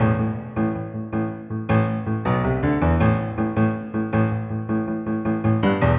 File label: Grand Piano; фортепиано